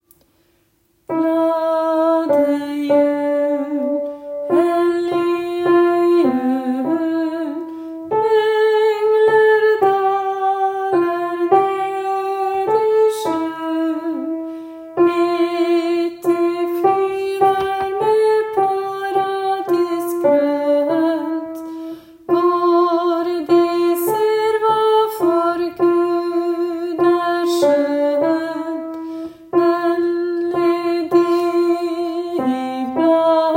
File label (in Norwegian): sopran; Glade jul vers 1+2; åfjord